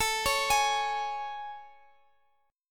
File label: A Major 7th A